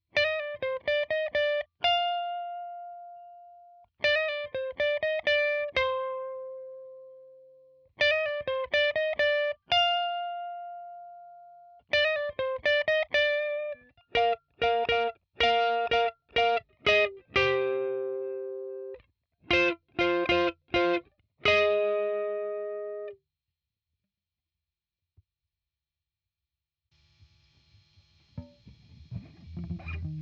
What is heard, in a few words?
Guitar